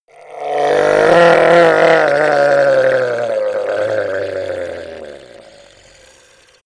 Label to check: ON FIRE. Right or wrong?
Wrong